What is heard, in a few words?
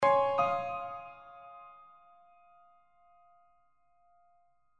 Piano; stingers; reflective